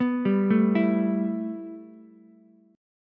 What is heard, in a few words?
A 7th Suspended 2nd A, Ukulele; arpeggiated